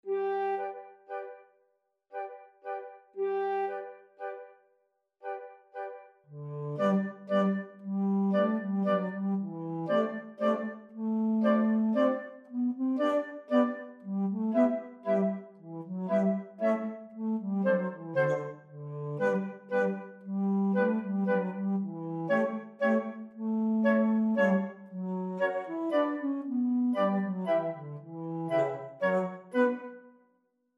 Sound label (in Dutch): fluit; L-Elephant; Saint-Saens; Carnaval des Animaux